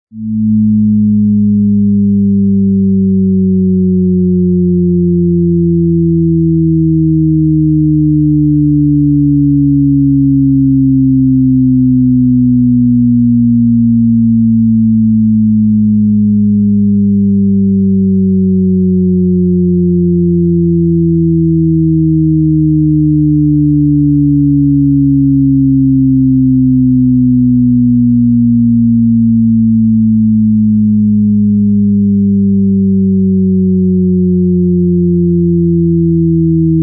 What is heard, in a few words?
shepard tone